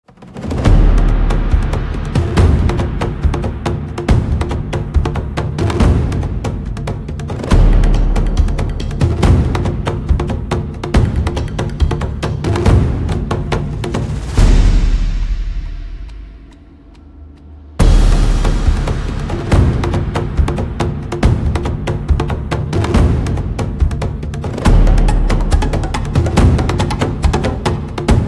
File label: combat music